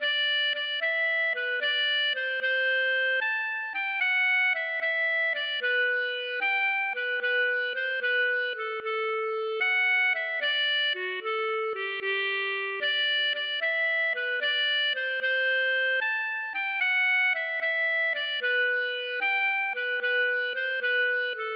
valse

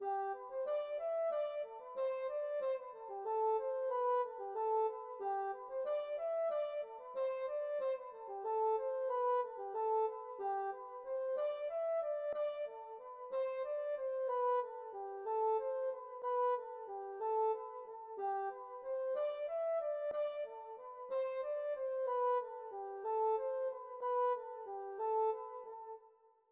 scottish Valse

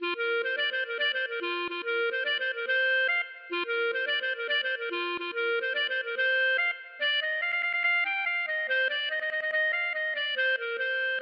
trompeuse

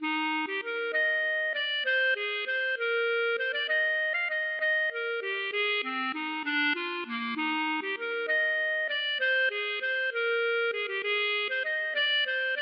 valse

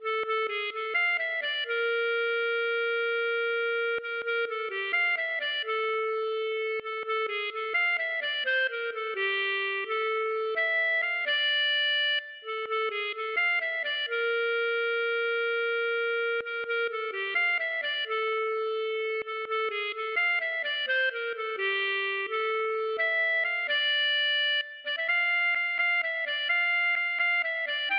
valse

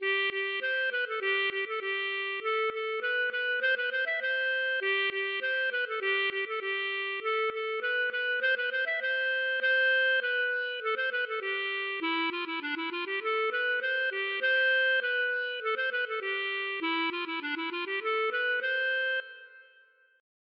aeroplane; Namnetes

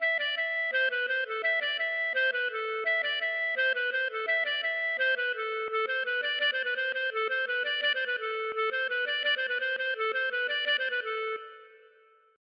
maraichine

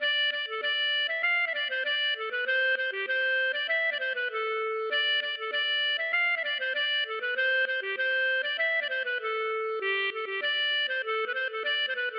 bourree; Derriere Chez Nous

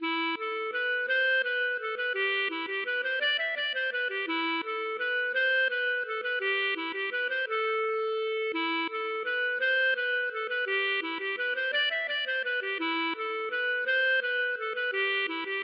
valse

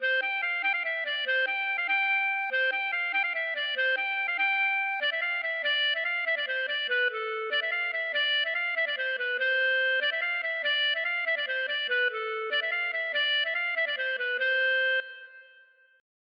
bourree; De Creuse

